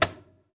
CAJON; Univers Sons - Raricussions

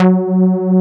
SLAM F#3; Best Service Alpha Dance vol.2; SLAM SEQ.1

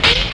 NOISE HAT; East West; Phat & Phunky

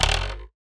Spoon, Foley; musicradar; cartoon caper

